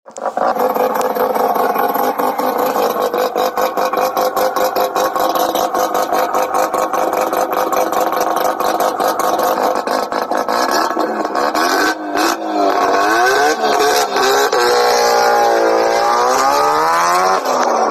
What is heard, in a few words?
brap brap rx7